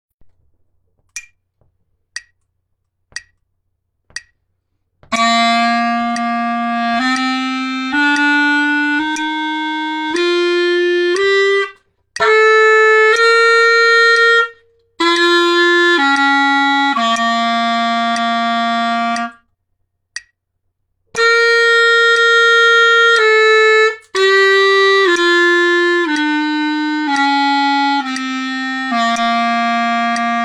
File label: Scale and Arpeggios